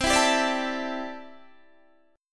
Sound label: C 6th Add 9th C down Guitar Standard; strummed; JGuitar